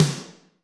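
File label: Snare With Verb, Alesis D4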